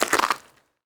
ice step; Golden Crayon Sounds

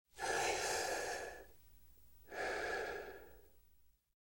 gas mask breathe; Original TK Sounds

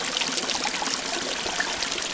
water fountain; Golden Crayon Sounds

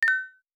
Direction Down Haptic; iOS 26 Sounds

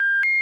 ultrabomb start; Original TK Sounds

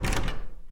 door loop; Golden Crayon Sounds

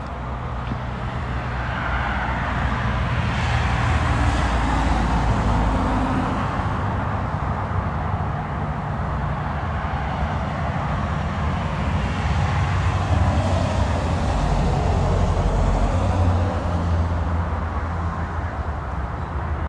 winter night suburban street; Golden Crayon Sounds

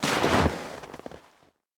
snow step; Golden Crayon Sounds

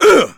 hit; Original TK Sounds

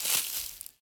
leaves step; Golden Crayon Sounds

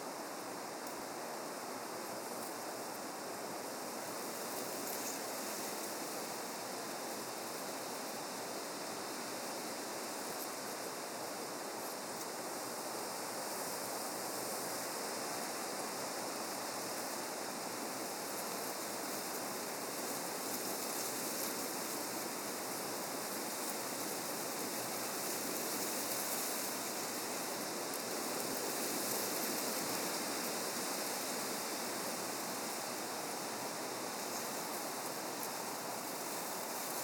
wind; Golden Crayon Sounds

